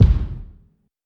big kick drum sound b key, Royality free steel kick drum tuned to the B note